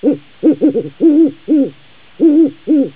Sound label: owl large hoots